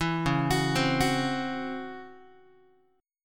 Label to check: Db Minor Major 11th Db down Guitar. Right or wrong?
Right